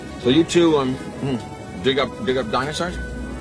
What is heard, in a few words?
dig up